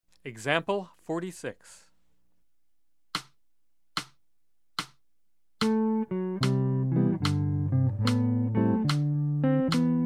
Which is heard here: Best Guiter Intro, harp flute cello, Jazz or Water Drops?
Jazz